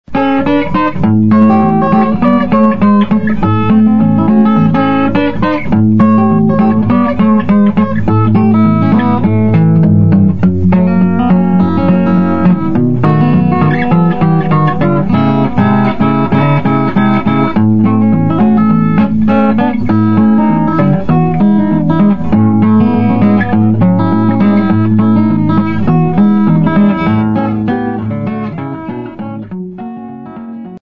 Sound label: guitar